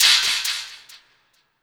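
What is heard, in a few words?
cymbal, drum & bass; Ueberschall; Drum & Bass Resonance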